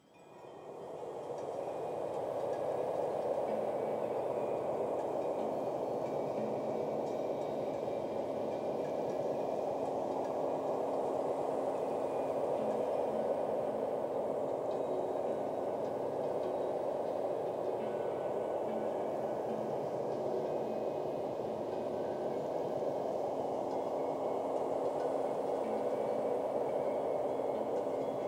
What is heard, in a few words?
Daytime Ambience Silent Sky